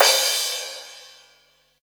crash R; Zero-G; Chemical Beats; CRASH ZOO

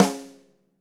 DW Green Maple Rim Shot Room; 1999 DW Green Maple Kit; One-Shots; Sonic Foundry (Sony Creative Software); Vital Drums

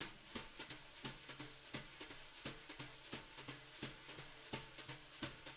BRUSH LOOPS, Living Drums

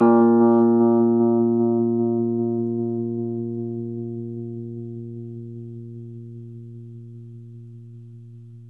Rhodes 73 Slow; E-MU Producer Series Vol. 5 – 3-D Audio Collection; 3DRhodes

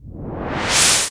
cinematic impact wipe

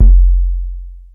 909 BOOM; E-MU Producer Series Vol. 2; More Studio Essentials; Composer; Technopourri

